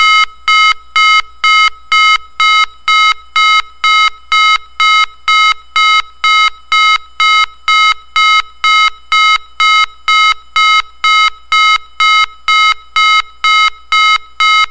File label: special warning